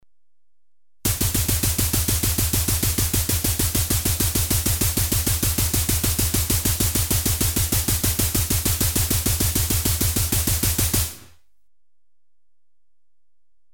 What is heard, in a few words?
Machine gun rhythm (slow), Electronically Generated Sounds; Sound Effects Library